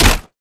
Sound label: bullet light ballistic spectre; Titanfall 2